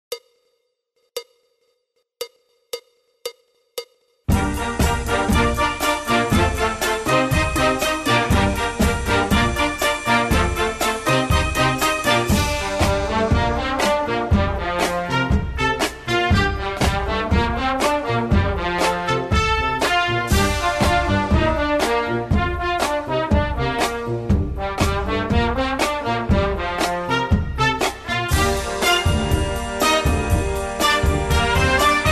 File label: Just Dance CLICK FAST